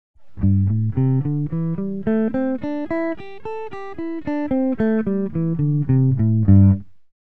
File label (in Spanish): penta menor patron